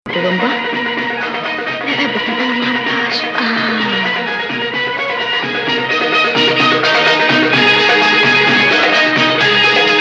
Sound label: Husseini Nahawand